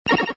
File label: pickup gold